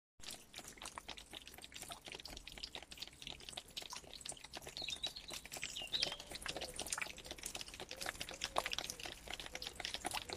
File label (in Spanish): Agua goteando piedras